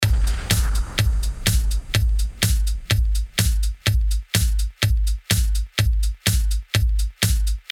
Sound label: Drums